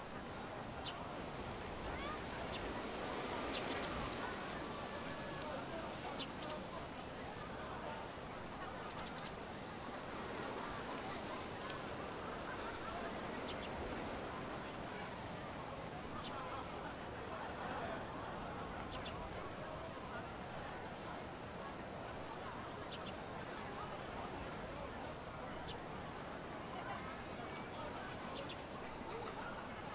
outdoor event plaza chatter; anemoi

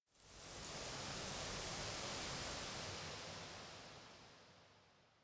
wheat sway wind; anemoi

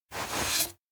tube pass; anemoi

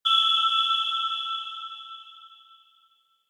elec grasswhistle blow; anemoi

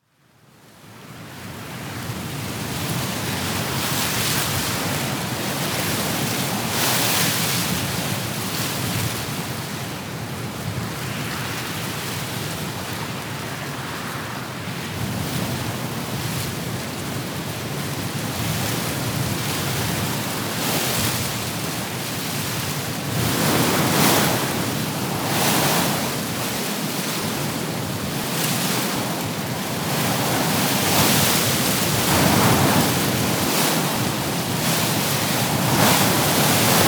wind; anemoi

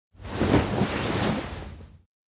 getup; anemoi パッケージ版

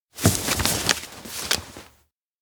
letter open; anemoi パッケージ版

